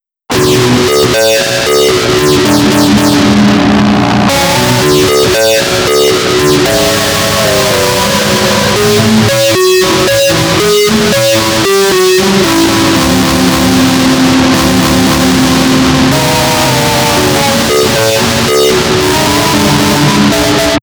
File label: Wii Sound